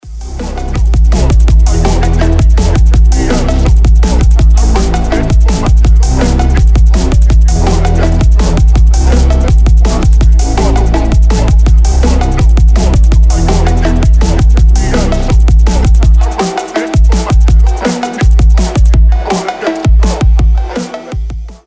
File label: fonkstep